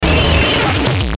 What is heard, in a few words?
Noise Hiss Sequence Glitch; Strange Tone; Blastwave